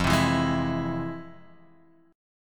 F Minor Major 7th, chord; FmM7 Chord {1 3 2 1 X 0} strummed

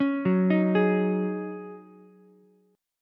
D sharp Minor 11th D sharp Ukulele C6, chord; arpeggiated; JGuitar

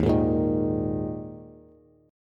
Eb Suspended 4th, Bass; Ebsus4 strummed; 11,11,8,8; JGuitar

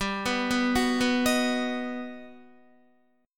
G Augmented Major 7th, Guitar; G+M7 arpeggiated; JGuitar

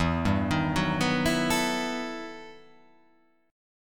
E Minor Major 11th E

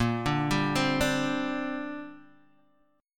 Bb 6th Add 9th Bb Guitar Open G

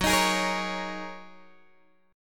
F sharp 6th Add 9th, down, Guitar, Open G

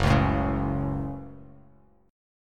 F sharp Minor F sharp, Bass Standard; strummed; JGuitar

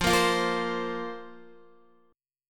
F Suspended 4th F, Guitar; Fsus4 strummed; x,8,10,10,10,8; JGuitar

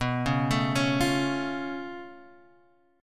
C sharp Augmented 7th B

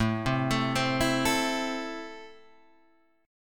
A Minor, Guitar, Open G

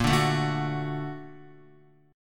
Bb Augmented Major 7th down Guitar; Bb+M7 strummed; JGuitar

